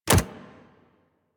Step Default, Foley Footsteps, Pets; Warframe; Zanuka

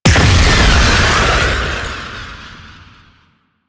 Octopede Wail End Burst; Warframe; Man In The Wall

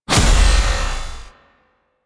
Hoverboard Race Gate Hit; Warframe; DE; Vehicles; Venus; Hoverboard Racing

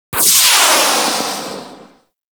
RPG Rocket; Hellion Elite

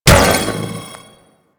Bounce Lev Projectile Hit; Warframe; Trapper

